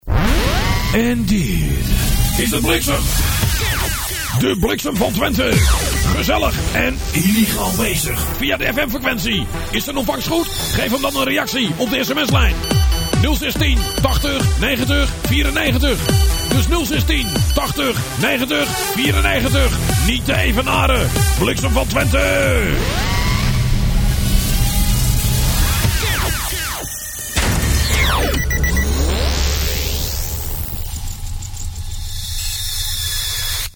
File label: Jingle; Bliksem van Twente